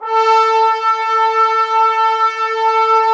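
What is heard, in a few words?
brass trombones A, Woodwind Brass Ensemble; 90 s Sample CDs; Miroslav Vitous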